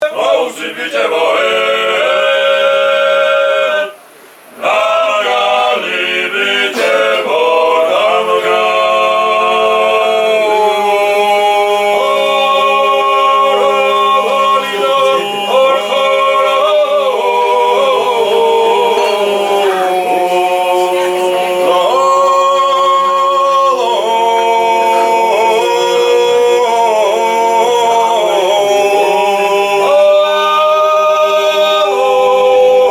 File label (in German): gesang